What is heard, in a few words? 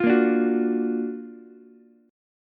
F sharp Augmented Major 7th F sharp Ukulele; strummed; 10,10,10,9; JGuitar